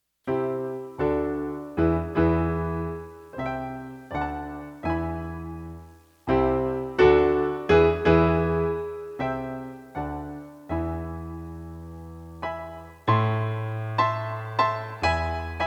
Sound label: piano intro; Dont Miss Christmas